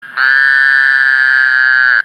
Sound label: Woodhouses Toad Anaxyrus woodhousii mating call